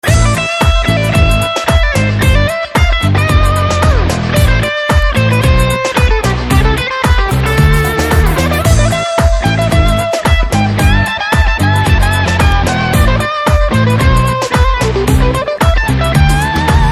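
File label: background music Ringtone; Rowdy Boys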